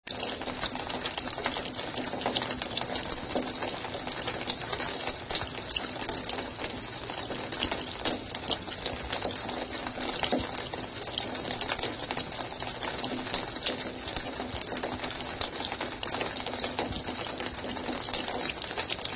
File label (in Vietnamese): Tieng nuoc chay